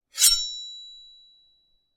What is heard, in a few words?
gore, large metal knife ring; Timothy McHugh; Gorification [HD]